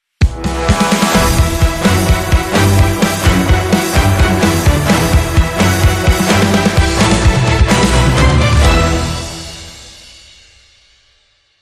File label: Hybrid Sting, Short